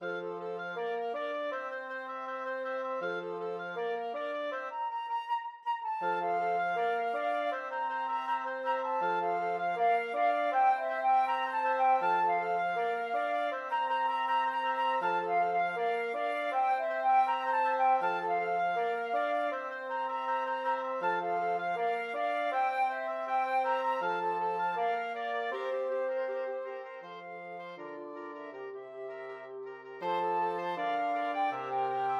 brass flexible mixed ensemble, 4 players

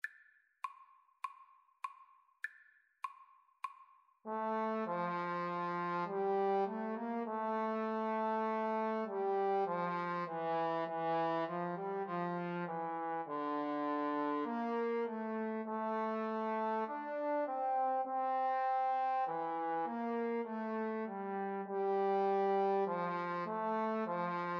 bach aria trombone duet

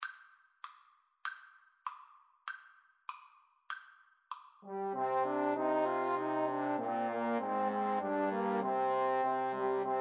huron carol, Trombone Trio

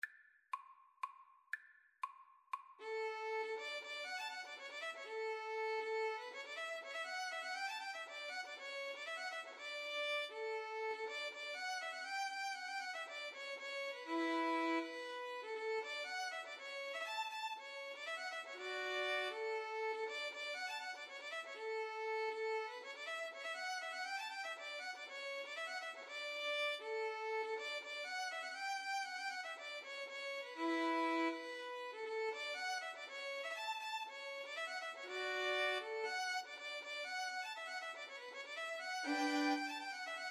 storpolska violin guitar; Violin-Guitar Duet; Bingsjo storpolska

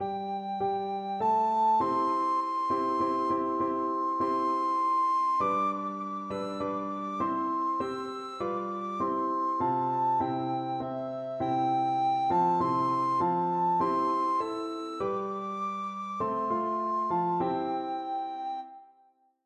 to the river, recorder

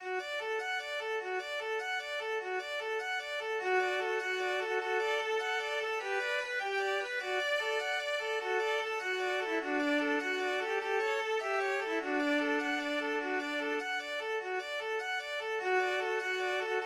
violin trio; o holy night

